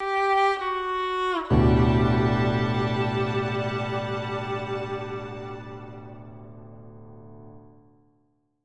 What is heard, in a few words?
Sad sting, soundtrack; minecraft story mode gamerip 2016; A Block and a Hard Place